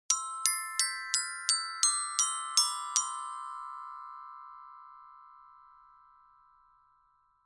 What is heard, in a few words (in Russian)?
orkestrovyj kolokolchik